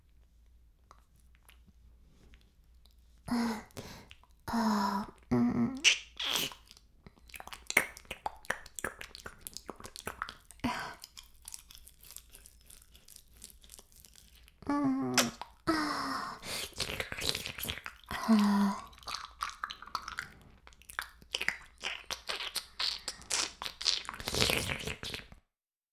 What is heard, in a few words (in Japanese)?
耳舐め音声; 白くて黒い魔性円環